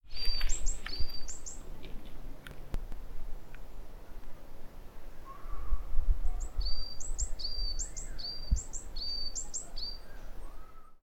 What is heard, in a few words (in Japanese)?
yamagara